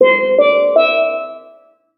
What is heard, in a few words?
Drum Puzzle; escape academy; Escape From Anti-Escape Island